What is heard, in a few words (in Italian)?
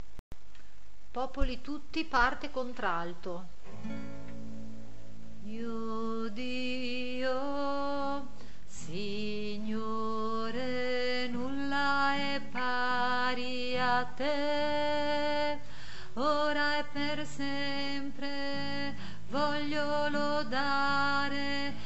Popoli tutti parte contralto